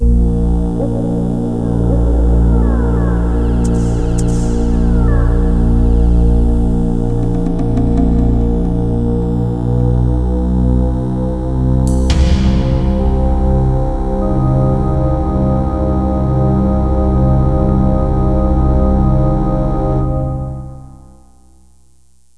theme

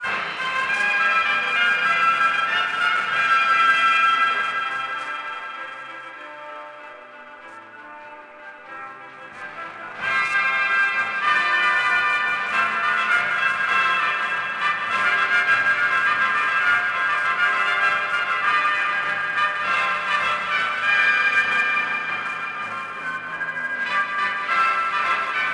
Winner; wesole przedszkole bolka i lolka; olimpiada letnia

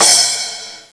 perc splash cym; Hexen Demo; Hexen Music; Hexen 6vox

